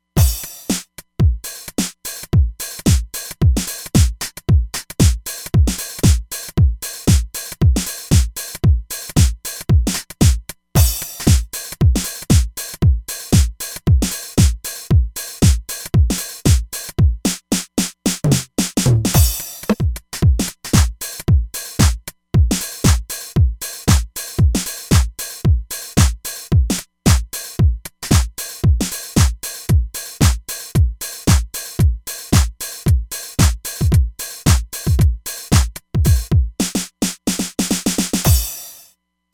House Kit, Roland Fantom XR Drums

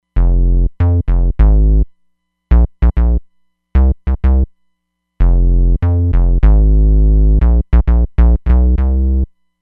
Nu RnB Bass

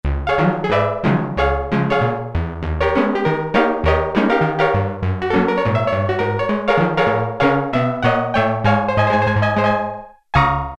Korg Poly-61M, Honky Tonk

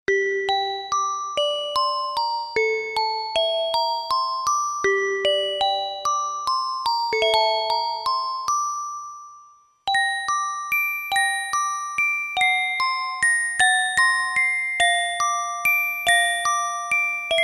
Glocken; Korg MSC-1S Variety

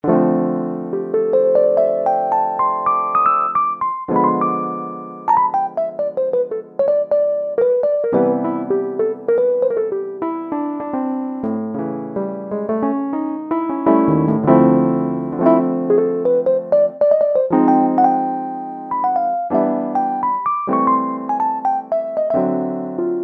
DRY ROAD LO, Old School Keyboards; Universal Sound Bank; Soundscan 09 Old School Keyboards

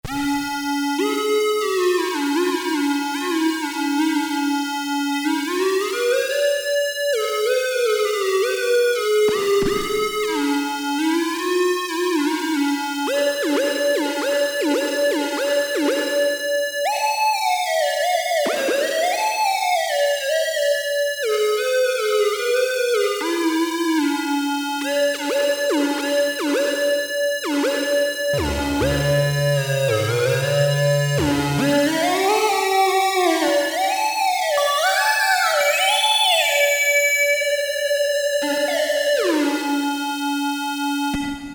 VCO 1 + VCO 2, Davoli Davolisint; Lucky

